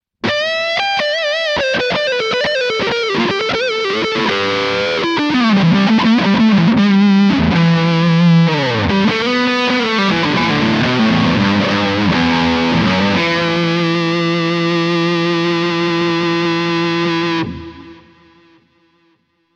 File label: Epiphone Les Paul 60s Neck PU Highgain Lead